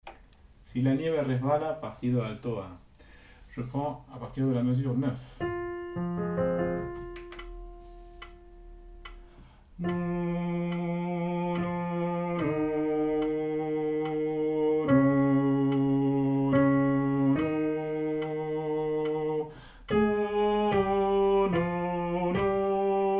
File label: Alto